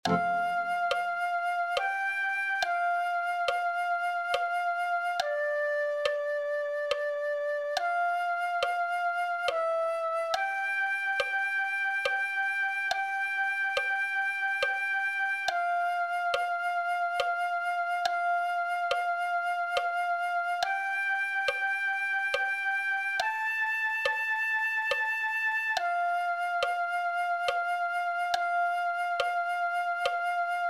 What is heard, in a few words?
flute